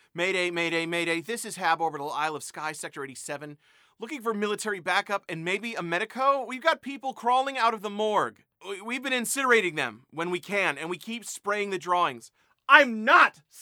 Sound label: Distress Signal